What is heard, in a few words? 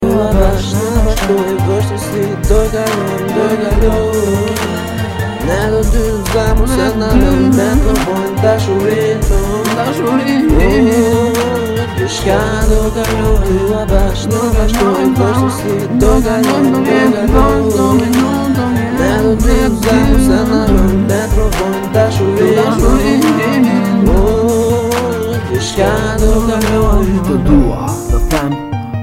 Hip Hop Rnb Song